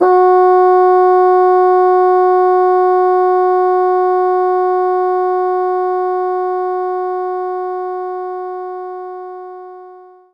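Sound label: Bassoon F#4; knights try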